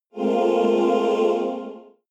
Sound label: evil choir chord short